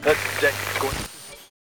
radio cutoff; vo; announcer; Counter Strike Model Pack; CS2; cs2 classic

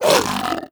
attack miss, zombie; creatures

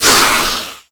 attack screech, headcrab black; creatures; vox

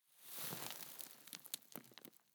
sand basket; ambient; dust2

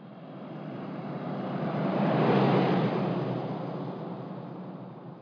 car, ambient

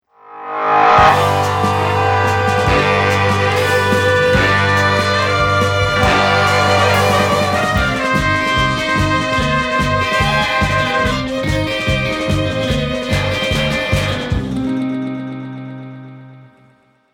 start action

